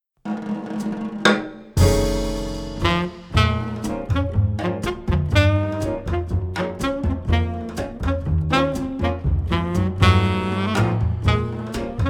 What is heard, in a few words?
Blues